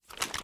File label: drop start, weapons; gmod; viper